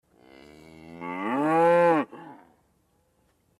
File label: cow; farm